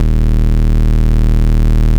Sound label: Bass Cuts; Vengeance Samples; VES1 Instruments